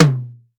small reverb tom drum single hit B key, Royality free tome drum sample tuned to the B